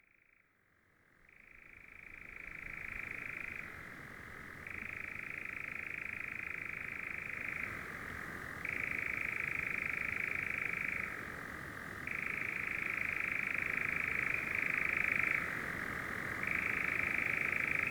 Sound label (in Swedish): nattskärra; fågelljud